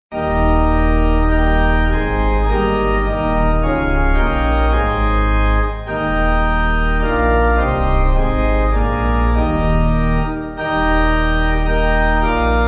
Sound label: Pipe